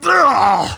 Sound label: pain severe; garrosh